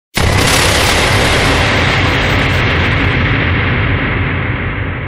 jumpscare; slender

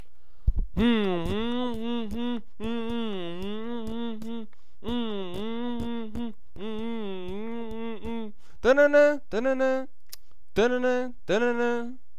bit box

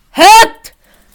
hit

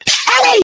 smack owie

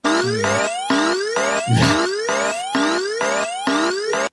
alert

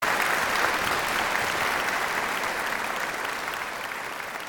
applause with clap

